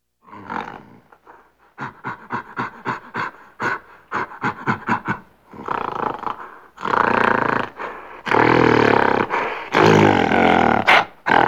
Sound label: Gorilla; Halloween Sound Effects